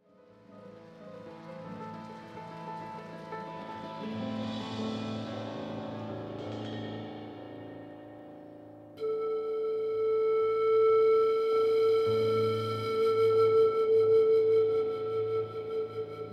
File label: Romanian Traditional free re imagined